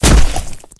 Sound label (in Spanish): caida